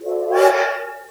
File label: Toot, BlackBerry phones